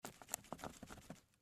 Shipping Package Shake Soft; Miscellaneous Office; Blastwave

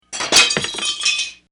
Glass Break; Glass (Sound Effects:Materials)

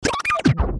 Glitch Beeps Slow Down, Glitch Static Low Digital; glitches; Blastwave

